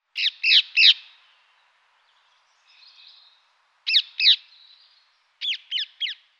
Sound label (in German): Halsbandsittich Psittacula krameri; Vogelstimmen